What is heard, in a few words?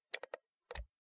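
Low pitched Mouse Single, mouse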